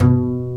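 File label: STR Cb Pizzicato, strings; Roland L-CD702; Cb Pizz 3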